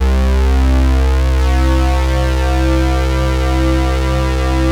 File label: Roland JX3 DIST